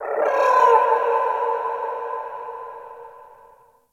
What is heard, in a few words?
Venus Landscape Distant Creatures Zone Two, Ambience; Warframe; Venus Open World